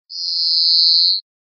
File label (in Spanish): quejas giros; verderon